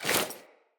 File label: Foley Action Default Melee Swipe, Default Clothing; Player